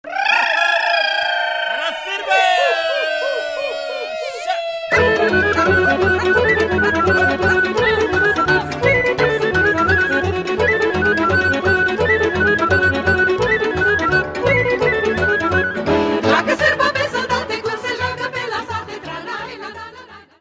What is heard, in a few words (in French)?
Sirba - Roumanie